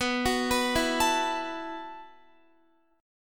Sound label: B 7th Suspended 4th B down Guitar; B7sus4; arpeggiated